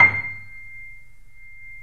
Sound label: 3D Boesendorfer; E-MU Producer Series Vol. 5; 3-D Audio Collection